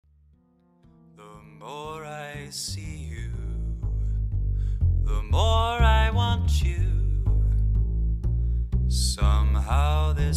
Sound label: Jazz Singer SOLO SHOW w BackingTrack; Showreel